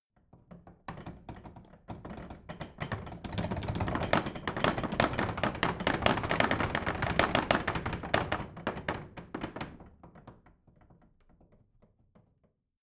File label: bathroom drawer rattle